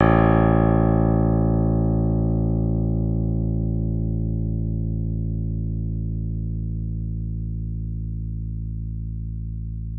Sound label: crosscoupling, pianos; Modelling sound generation in pianos